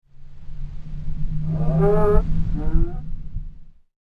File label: Sign Sways In Wind; Wind Sound Effects; horror sound effect